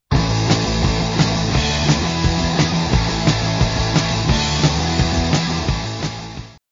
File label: Examples of I-IV-V chords